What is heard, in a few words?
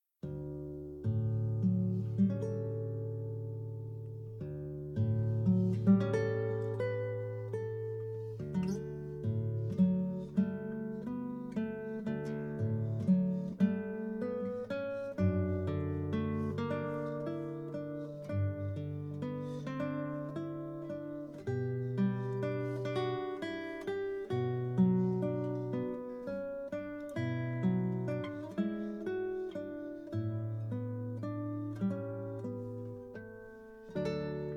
Guitar; Tekken 7